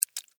Blood Spill Drip, Horror, Blood, Spill, Drip; Single, Short; Blood and Guts

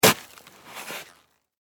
Weather Snow Shovel Scoop Pick Up

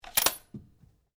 RIFLE REMMINGTON700 7.62MM BOLT ACTION OPEN; A selection of domesttic firearms; Rifles; Guns

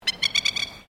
BIRD PLOVER SPUR WINGED CALL, Australian birds; Animals

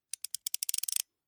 Ratchet Spanner Tightening Clicks Slow; Hand Tools; Tom Hutchings

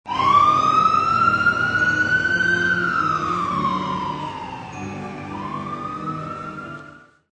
Police Siren, Police or ambulance siren.; Siren Sound Effects; Electronics

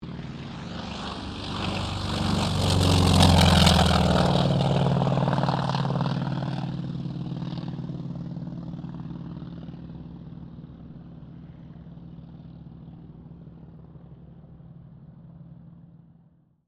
AIRCRAFT T-28D TROJAN TAKEOFF; Airplanes (Sound Effects:Vehicles)

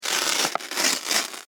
Envelope Manila Tear Open Long; Paper; Offices

